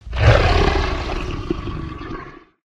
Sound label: idle, bloodsucker